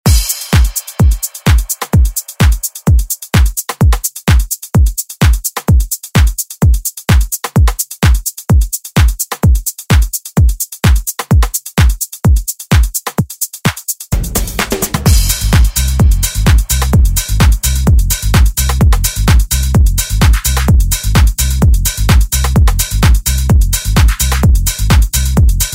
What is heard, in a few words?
Tech House; Deejay Hit